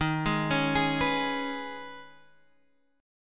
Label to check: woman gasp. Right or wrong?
Wrong